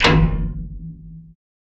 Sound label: plasm pipe, spacejunk; Spectrasonic Distorted Reality 2